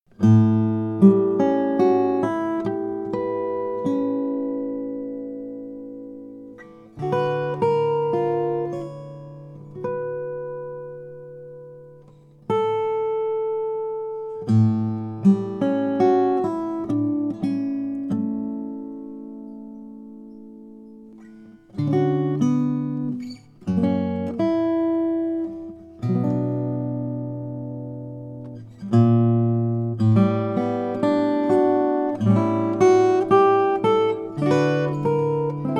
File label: Sustain Aether